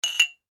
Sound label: household glasses tumblers x2 clink; Drinking Glasses (X2) Clink Together